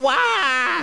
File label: Waaaaaah; Luigi